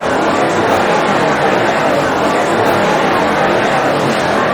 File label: outrage